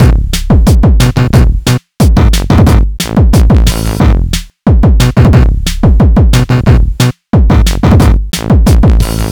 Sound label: my beat